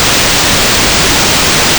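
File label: MicroKorg Noise, Noises Analog; Xfer Records Serum; Serum Presets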